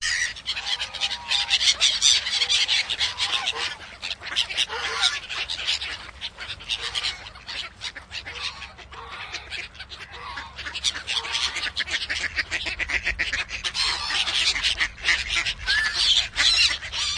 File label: duck brigade; Zoo and Nature